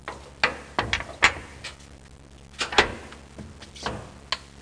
hatch; WAV Sound Effects; transpo; sea

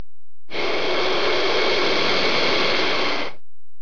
LIZARD

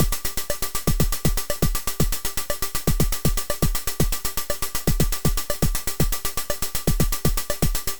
DRUMBEAT

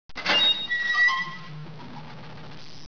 DOOR

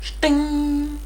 Chting; Chameaux

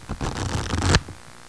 shuffle; bridge

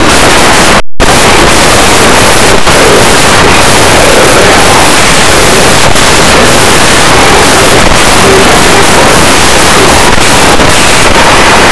boom; Blue Heat

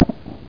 thump

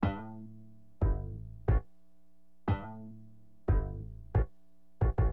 bent bass, hip hop; LiveAct Pool

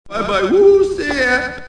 Exit Windows; Buzz Light-years Away II

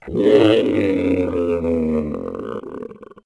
rock die; DAoC

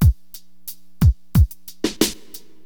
Drums loop, HipHop; LiveAct Pool 1

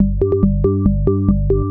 Bass; Techno Music Maker

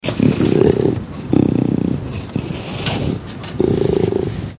leopard; Nestle Animal Planet Land

